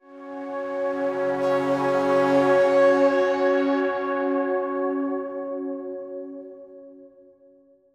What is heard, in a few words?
Synth B Long